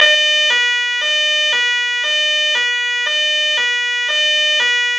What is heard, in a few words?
Bi-Tone 490-610